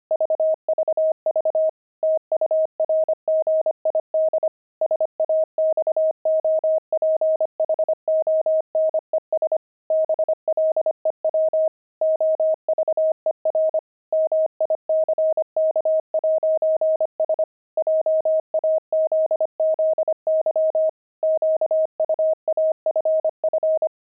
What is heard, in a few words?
pangram saxophones